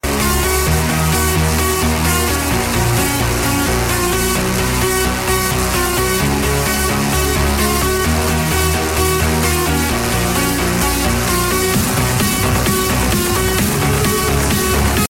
nokia sp elegant

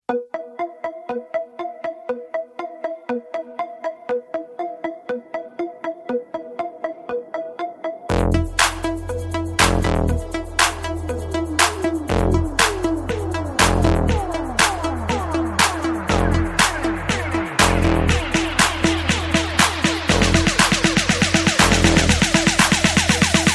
music hindi

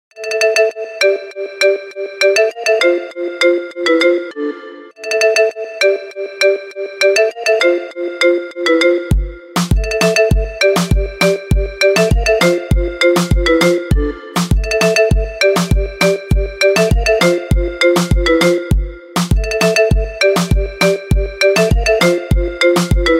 apple iphone xs, ringtone

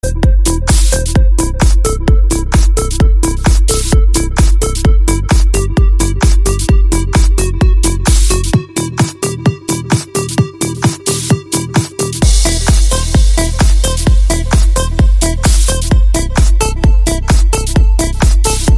electro dance mix

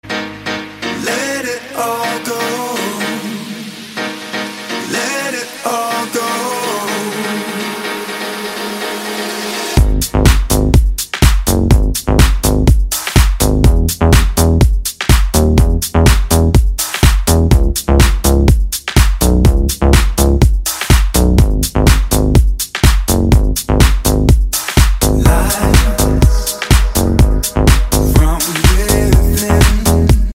dubstep

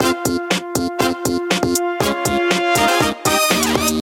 sony xperia gimme notification

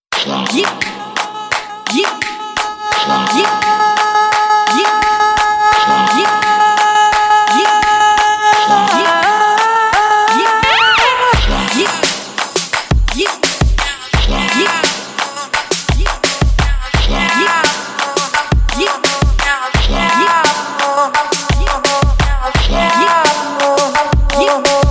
arabic music

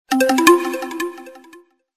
merrily notification

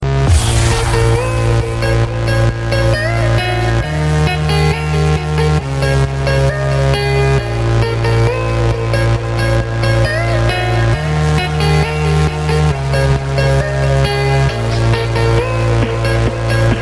music; iphone

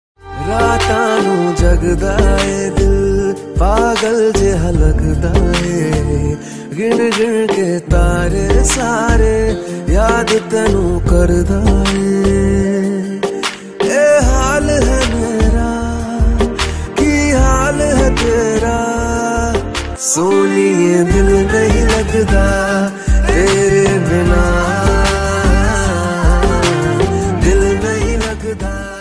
romantic

